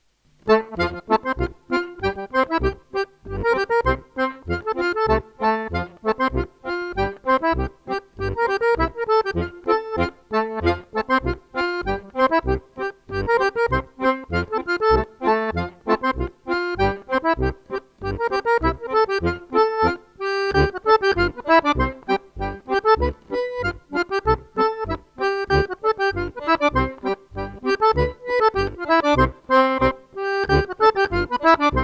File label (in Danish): Rejnlaender Polka